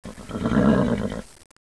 snort

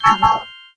combo; Frontal Assault

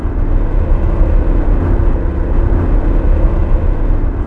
planet; Far Gate; ENGLISH

